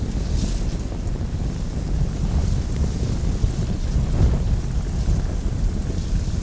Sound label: fire burn